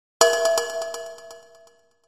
Cow Bell W Echo

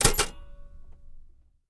Typewriter Shift Ke

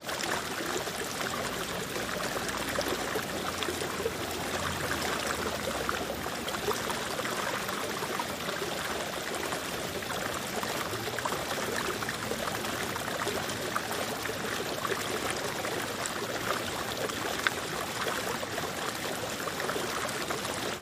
Water drains onto pavement from fire hydrant.; Water, Flowing Gushing, Water Running, Water / Bathroom

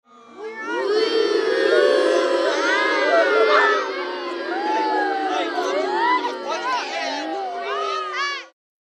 Kids Booing Crowd

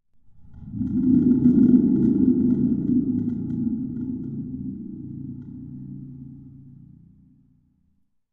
Spring Drum Big Shake

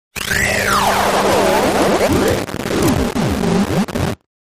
Static Distorted Rip

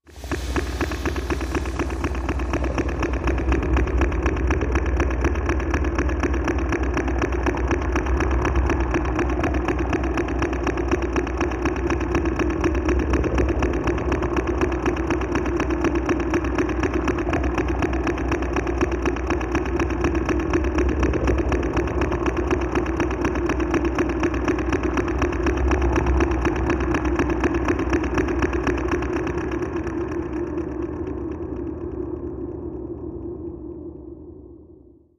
Neptune Generator Mac, mechanical

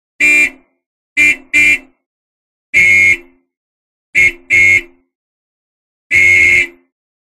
Horn Austin Sixteen W

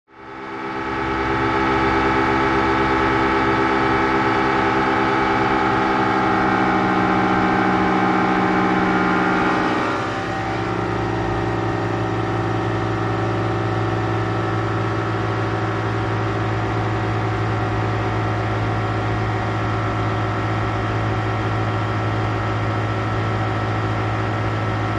Ranger Boat On Board

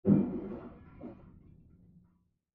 Crash Glass Impact T, windows & mirrors

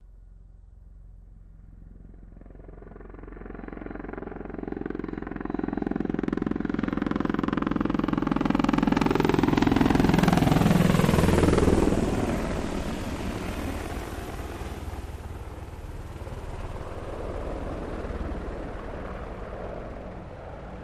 Apache By Med Right, AH-64 Apache

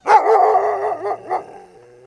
Dog Barks Husky Growl

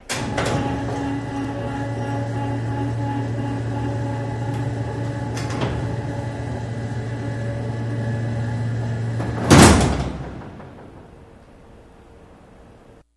Elect Prison Door, jail & prison doors

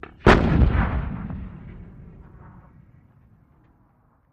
105mm Howitzer: Fires, Close-up. Dual

